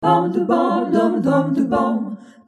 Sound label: 98 Jazzy Harmony